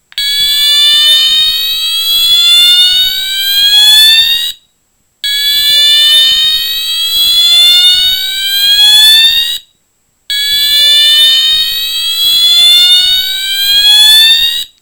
Slow Whoop; Fire Alarms

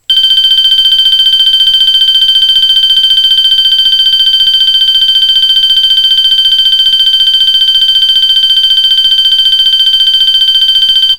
MT Bell; Fire Alarms; March Time Horn

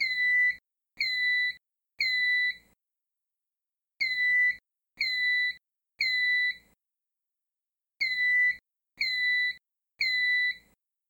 Panel Chirp Alarm and Monitor; EST3

